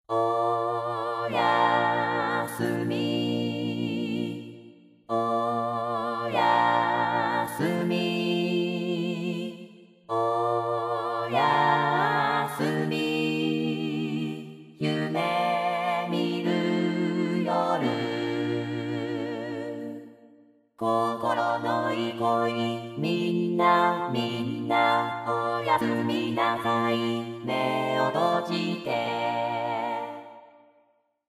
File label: chor